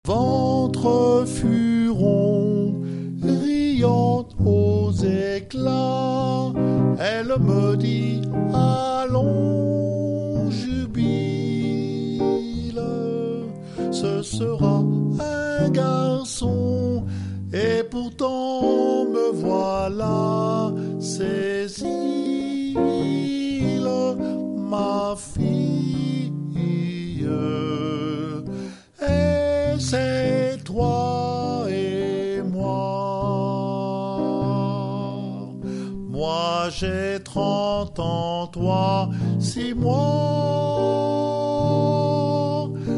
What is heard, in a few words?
Tenor